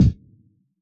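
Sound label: bass drum Jungle, Yamaha DJX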